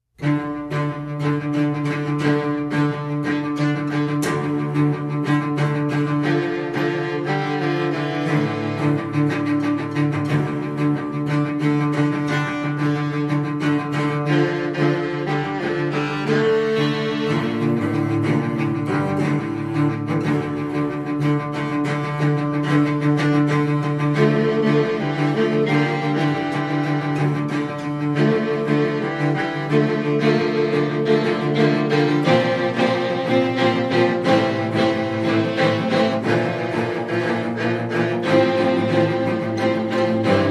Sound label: Wood sample